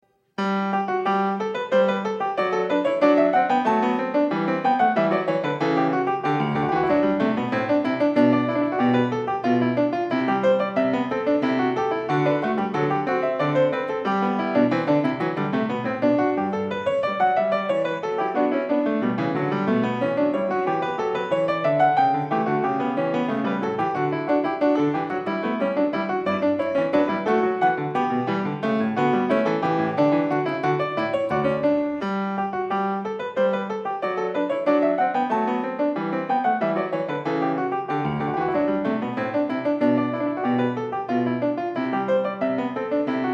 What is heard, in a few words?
Goldberg Variations Canone alla Quarta, PIANISTS; Bach; 1982